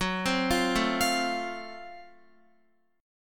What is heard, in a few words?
Gb Minor Major 7th Flat 5th, Guitar, chord; arpeggiated